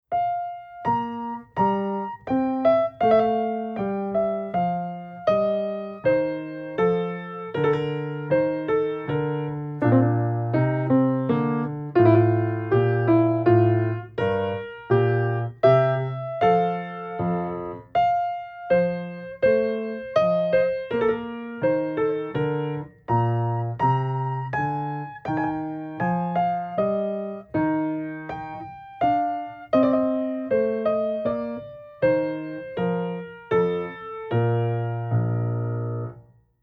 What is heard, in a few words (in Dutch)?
Pralltrillers en mordenten, Piano